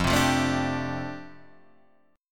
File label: F 6th Add 9th F down, chord